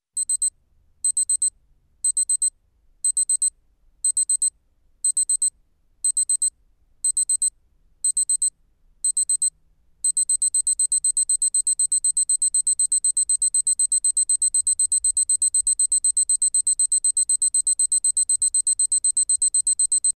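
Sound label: zvuk budilnika, alarm clock sound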